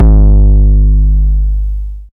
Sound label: long kick single hit g sharp key; kick drum samples